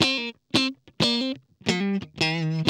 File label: GUI 90 LICKS